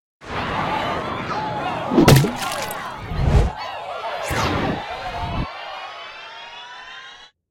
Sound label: random feature beer mugs small; finn